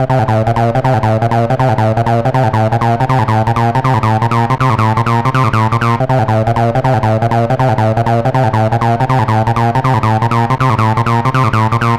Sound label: Hardcore Loops Acid Loops, Dance Synths, 160; Best Service ProSamples vol.37